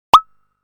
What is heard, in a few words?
Wasp-Drum; drums